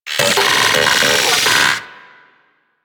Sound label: Vocal Pain D; Warframe; Corpus; Arachnoid Wraith